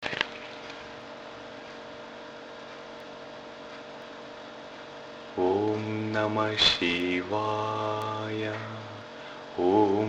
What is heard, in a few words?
Om Nama Shivaya